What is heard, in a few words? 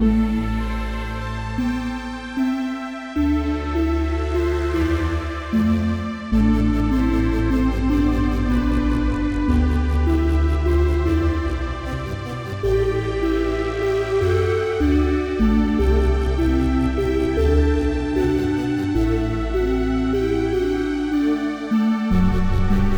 predynastic dawn